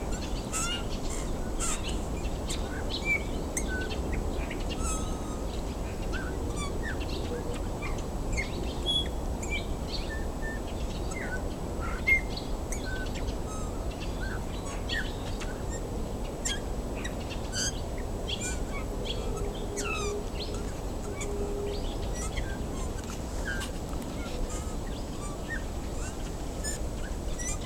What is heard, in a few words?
Pyr cin song backgr Pas mon